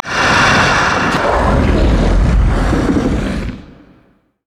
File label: spider idle; LoL